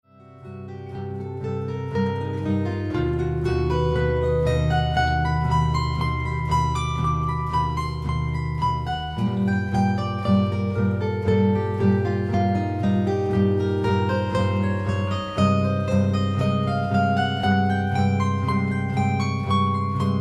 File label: Prelude in G minor; Prelude Xxii